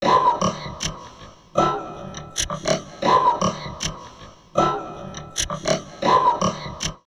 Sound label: VOCOD, Drum Loops Crazy Processed